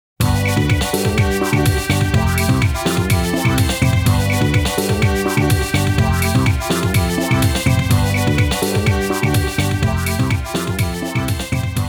Winning Theme; Vampire Sound Box; Darkstalkers; AULBATH

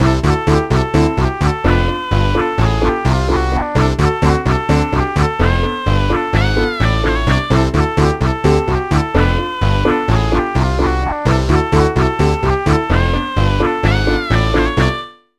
Rock And Roll; dream dancer 2009 nds gamerip

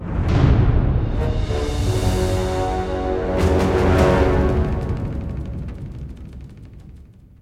UI BGM Lose; CastraWar

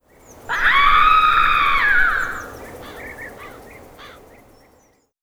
Female Scream

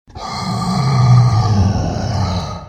Monster Scream; Don't Be Afraid The First Toy

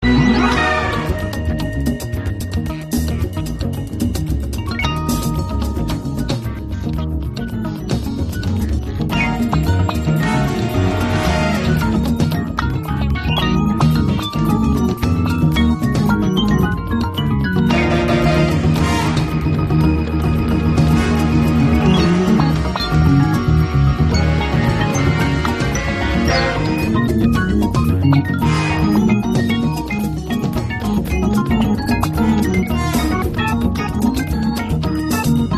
Loop Groove

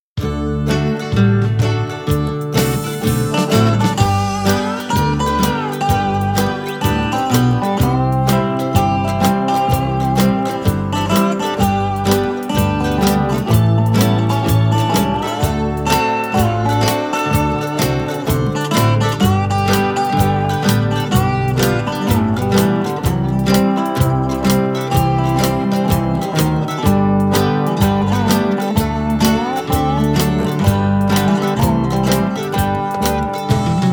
Blues; Farming Simulator 22 Platinum Expansion

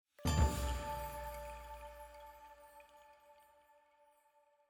item Jingle Bell chime Perc D

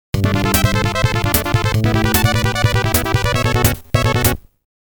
FANFARE; Legend of Joe Musashi Shinobi Music Collection

